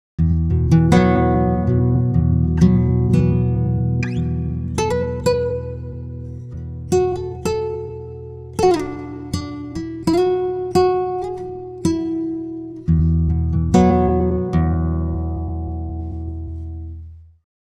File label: guitar, pitchshift; usb uvi workstation